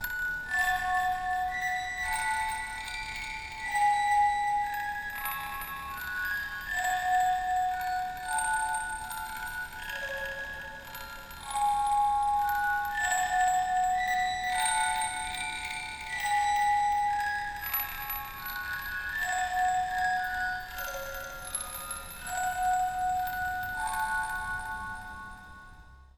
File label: What Child Is This Erie Scary